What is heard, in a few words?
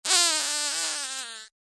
human fart short high pitched squeaky forced